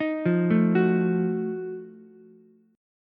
F 7th Flat 9th F down Ukulele C6; F7b9 arpeggiated

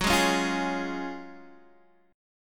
Gb Major 7th Suspended 2nd, Guitar Open D

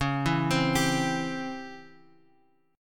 Db Minor Major 7th Flat 5th Db Guitar Open G

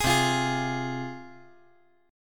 C Suspended 4th Sharp 5th, C, down, chord

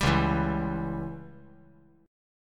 C sharp Diminished C sharp down 5G 4C 5E 5A; C#dim strummed; JGuitar

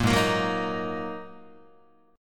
G sharp 7th Suspended 2nd G sharp Guitar; G#7sus2 strummed; 1,4,1,4,2,2; JGuitar

